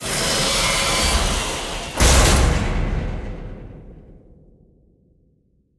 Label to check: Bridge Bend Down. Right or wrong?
Right